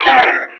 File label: Enemy Pain; Warframe; Lasrian; Battle Barks